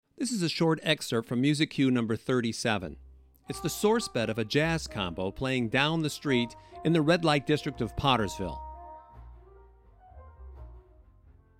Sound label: Music Cue Street Scene; Pottersville; MUS-37 Pottersville Street Scene; It's A Wonderful Life!